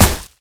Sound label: impact; dota; queenofpain